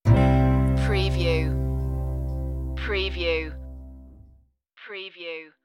my home town stinger

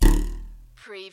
CARTOON RULER TWANG

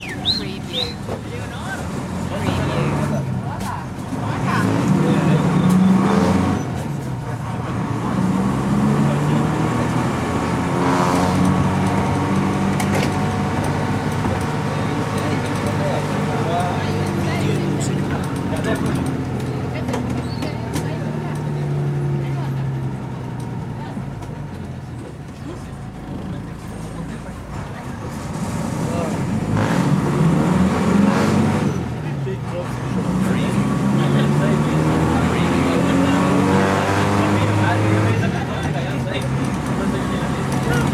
bus ride nicaragua

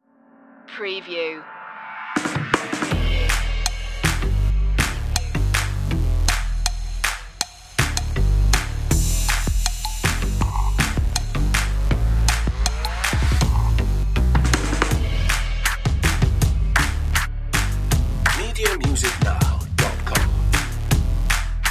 drums and bass

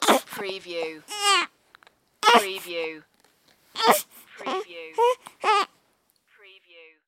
Baby Complaining

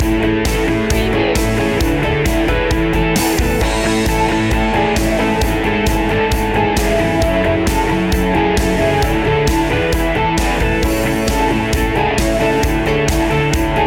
business indie rock

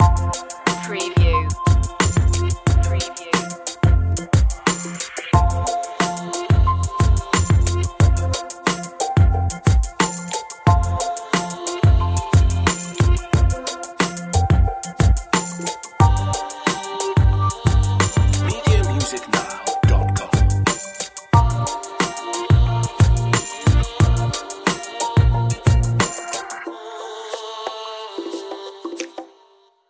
electro hip hop

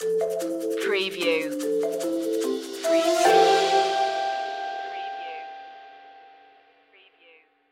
flying home stinger

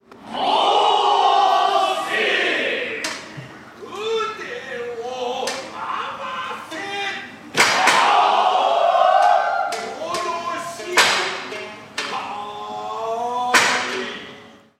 Kabuki theater male performance whips Japan

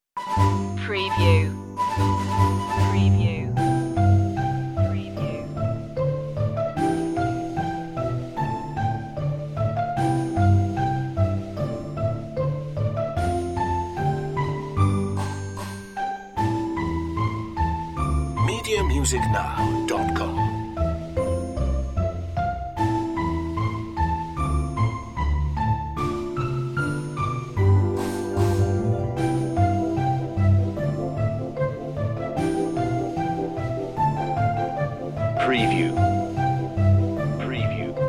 sunset party no strings pizz chords

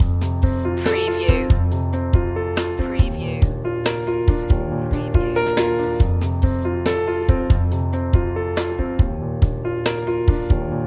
acoustic mood loop

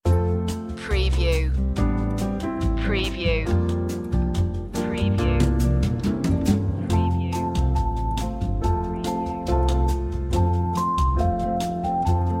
jingle bells latin

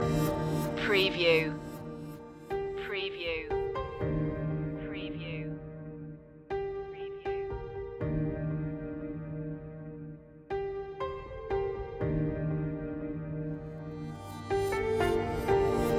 descant sky loop

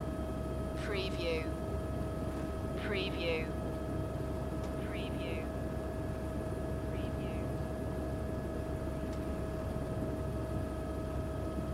Helicopter Flying Window Open Shut Idle

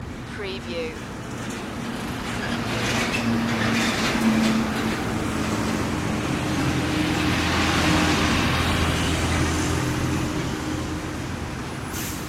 heavy harbor traffic loop

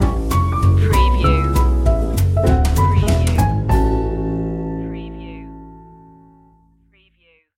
jazz stinger; we wish you a merry christmas

